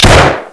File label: flashbang; weapons